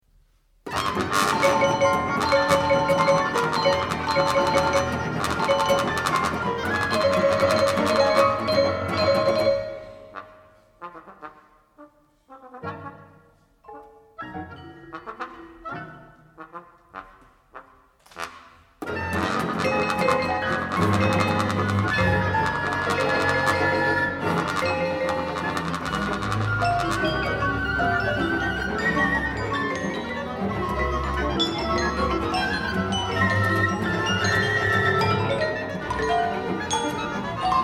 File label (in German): konzert fuer posaune; goldmann